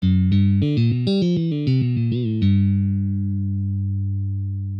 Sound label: Lick in G